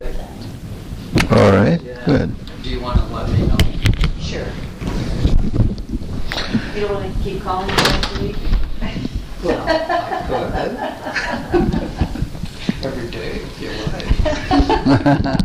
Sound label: Octaves